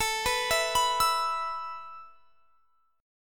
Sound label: A Suspended 2nd A; Asus2; arpeggiated; x,24,21,21,24,24